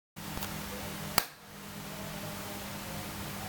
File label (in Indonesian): tepuk tangan